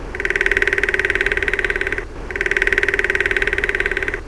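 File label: woodpecker; DAoC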